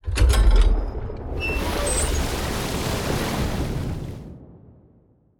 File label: flooding chamber; rifts; Stellaris; astral planes